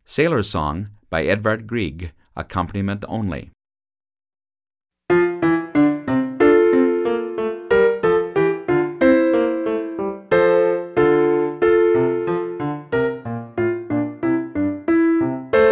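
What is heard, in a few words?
Sailors Song accompaniment, band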